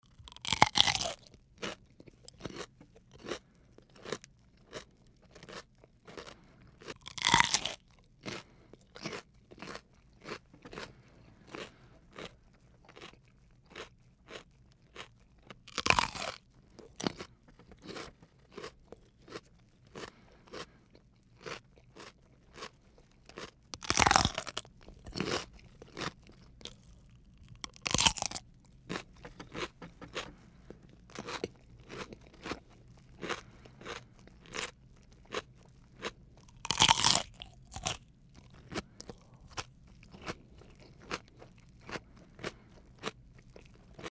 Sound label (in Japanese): kyuri